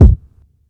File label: Royality free kick drum one shot tuned to the D# note.; kick drum samples; D sharp key